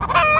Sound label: chicken die